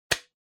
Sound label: Outlet Timer Dial Grab; タイマー機器